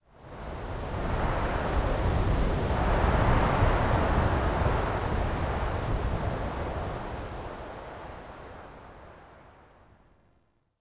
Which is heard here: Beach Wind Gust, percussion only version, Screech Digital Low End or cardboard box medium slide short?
Beach Wind Gust